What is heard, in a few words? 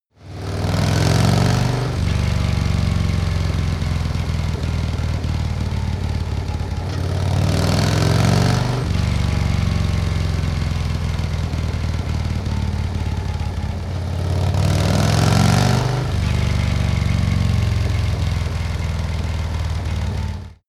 motorcycle harley accelerate triple hard; motorraeder; verkehr transport